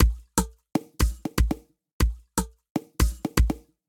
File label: Sewer Drums; Drum Racks; Ableton; Factory Packs; Lost and Found